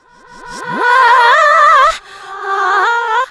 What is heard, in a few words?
REVERS FX, REVERSE FX, Funky Vocals; USB Soundscan vol.18; AKAI